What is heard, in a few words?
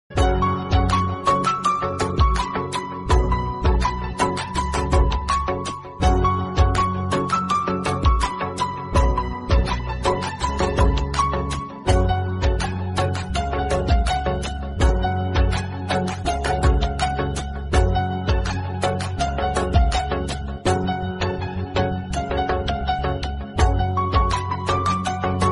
bgm new love ringtone love bgm love ringtone